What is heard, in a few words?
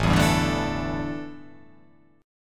A 7th Suspended 4th, A, down